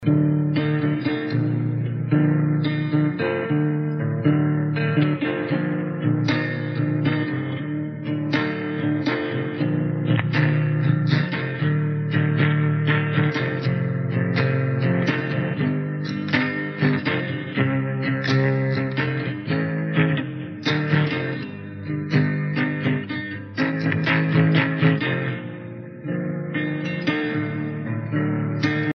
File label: acoustic